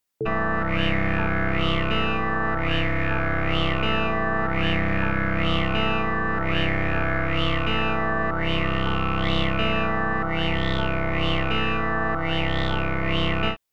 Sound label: Five Tones; Powers To Amps And Filterfreqs